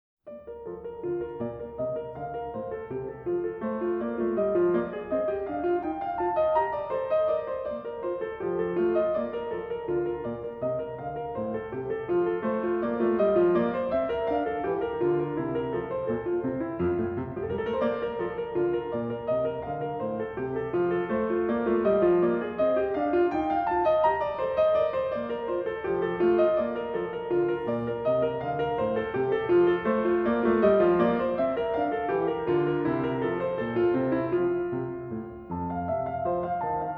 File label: Keyboard Partita In B Flat Menuet; Bach; Keyboard Partita 1; BWV 825